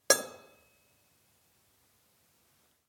Metallstueck

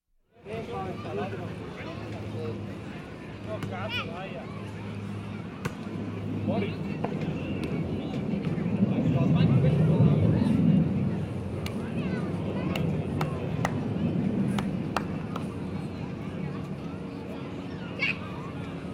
Grossstadtgeraeusche Spiel Und Spass Im Park

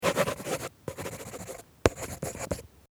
office schreiben

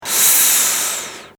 vox atmen

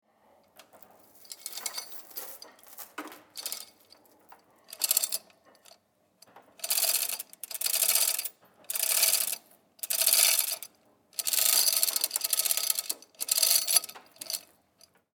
Flaschenzug

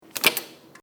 Geldautomat Karte raus

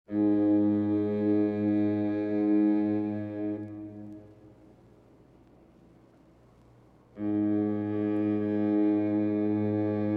Marine Nebelhorn

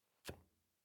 Kueche Sounds

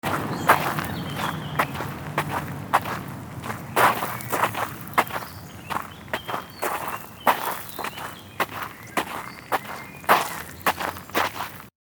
Spielplatz Schritte

